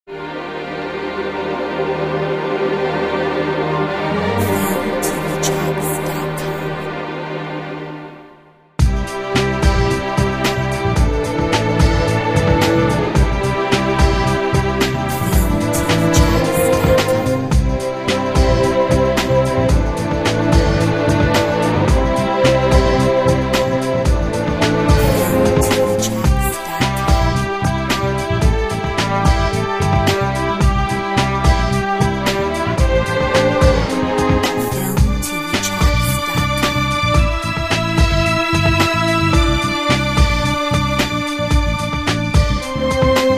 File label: New Clocks Marimba; Filmtv-tracks